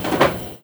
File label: Foley